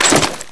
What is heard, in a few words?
aug draw; czero opforce